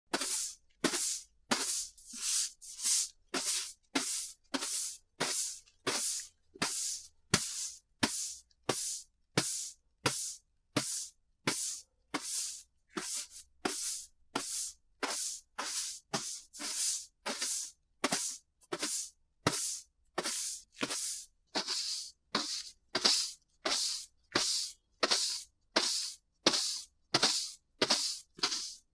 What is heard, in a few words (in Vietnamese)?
tiếng chổi quét sân hiên nhà ngoài trời